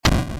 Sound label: Asteroid Break; Doodle Jump; 3DS, Android, Arcade, DS, iOS, Mobile, Xbox 360